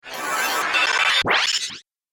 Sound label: Teleport, magic; Magic Sound Effects